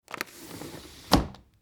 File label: Window Slide Close; blindside